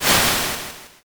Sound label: swoosh